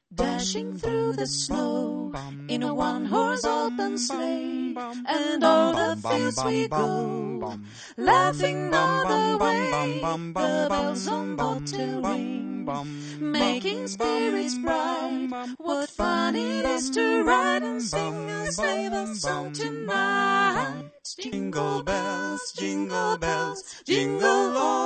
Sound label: Jingle baryton